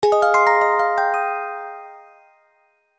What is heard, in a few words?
savepoint; easyrpg; WitchsHeart